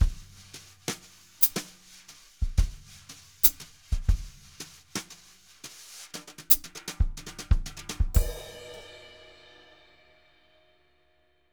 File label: jazz brush loops